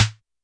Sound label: Snare Analog L, YAMAHA RM1X